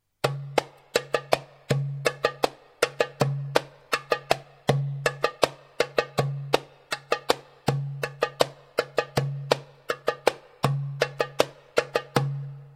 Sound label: darbuka